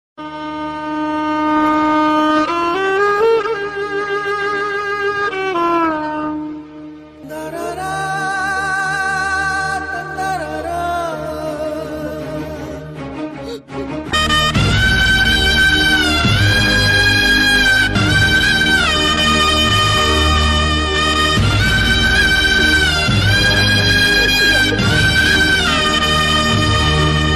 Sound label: very emotional bgm ringtone; chatrapathi movie; telugu bgm ringtones